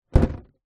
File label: garden table plastic set down on ground